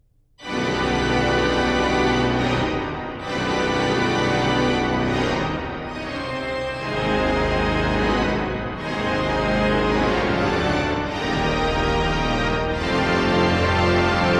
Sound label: neumann km136; bonn